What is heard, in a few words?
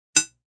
Clink, Metallic; Metallic Sound Effects